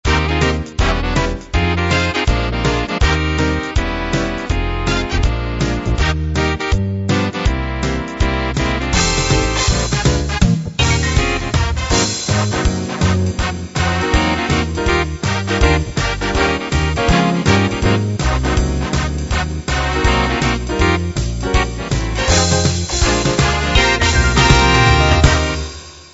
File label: broadway